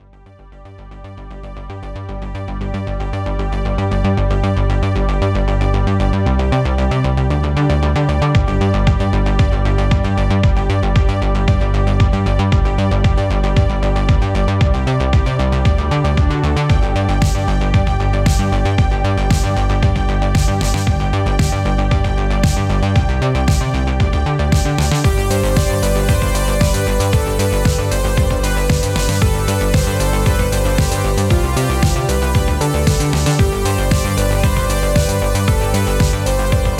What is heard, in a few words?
Instrumental